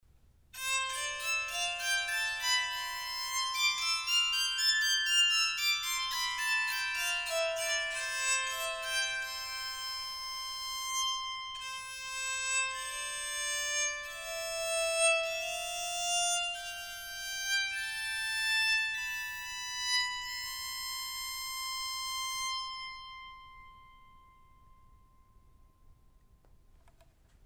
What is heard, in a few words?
birdseye sound carved, Soprano bowed psaltery